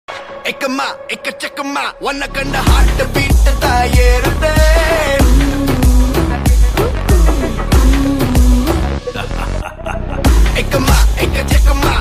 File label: song bgm; Vettaiyan